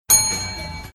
sound of bell

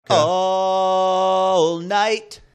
singing all night

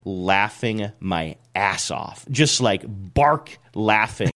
laughing my ass off bark laughing

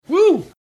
whoo

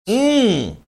"mmm"

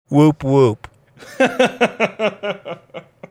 whoop whoop

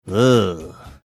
euugh

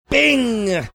bing

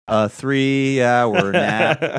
singing three hour nap

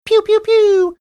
making air horn sounds

